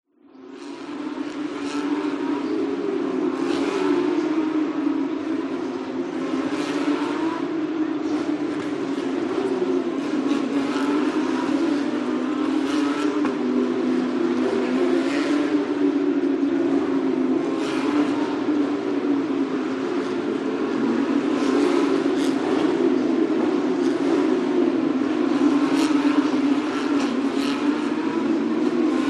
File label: motor racing porsche carrera challenge announcer in bg singapore